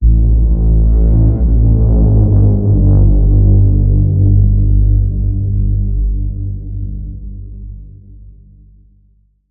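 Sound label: Bend; Sinking Feeling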